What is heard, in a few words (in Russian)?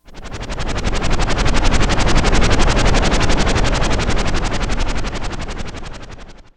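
четкий звук вращения лопастей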